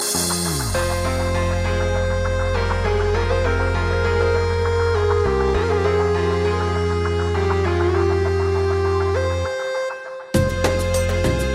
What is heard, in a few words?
Romance Sad Music